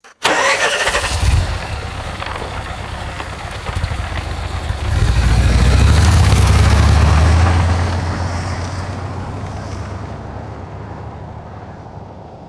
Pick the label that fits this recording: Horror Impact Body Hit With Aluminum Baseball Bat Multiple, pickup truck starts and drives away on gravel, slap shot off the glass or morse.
pickup truck starts and drives away on gravel